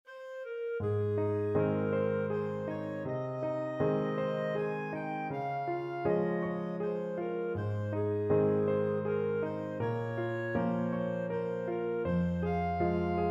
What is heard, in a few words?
death and the sinner, clarinet